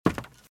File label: Footsteps - Wood - Creaky - Run - Right; RadwinIsland